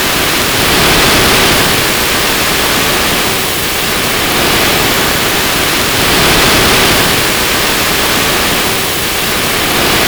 Sfx Wintergames

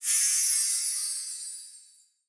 Level Up; Rabbids Multiverse

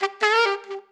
Giggle; The Act; Arcade, iOS, MacOS, Mobile

